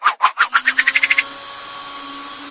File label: special; nickelodeon basketball stars 2015